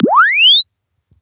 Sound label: Press Two Sides Chirp; Samsung 4 Recordings